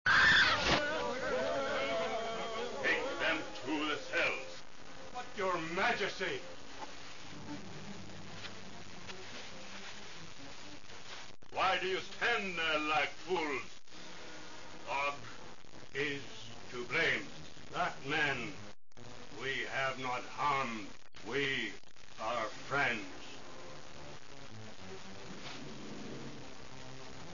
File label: rock